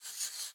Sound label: Sketch Env Write